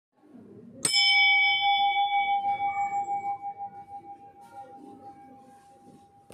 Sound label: bell